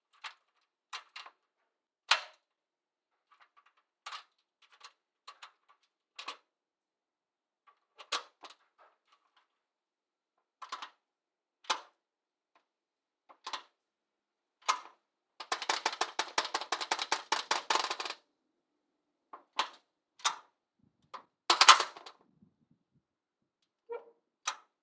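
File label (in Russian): металлическая дверная погремушка и ручка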